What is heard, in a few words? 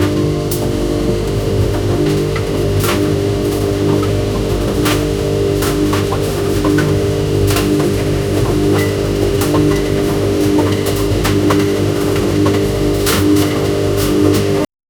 washing machine is under; home appliances